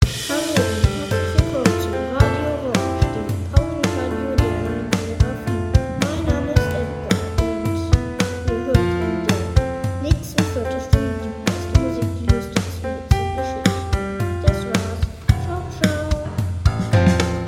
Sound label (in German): jingle